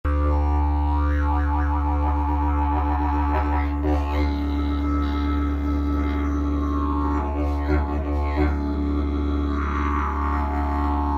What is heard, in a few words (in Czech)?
jilm, didgeridoo; koralovka